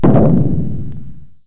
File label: explosion good deep